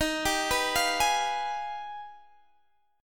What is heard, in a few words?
G sharp Minor Major 13th; x,18,17,16,18,16